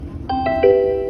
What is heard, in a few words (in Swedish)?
pendeltag jingel